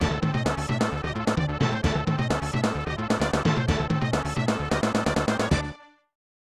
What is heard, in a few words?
League Match Opening; J.League Excite Stage 96; SNES